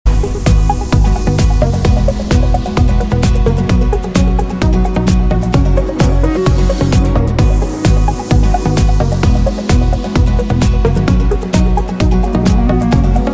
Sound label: Bg Tone